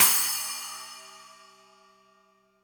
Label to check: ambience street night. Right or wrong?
Wrong